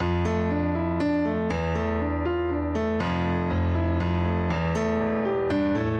Piano; Компрессия

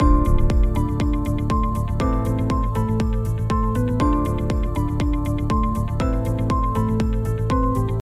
Test Track mix (+saturation)

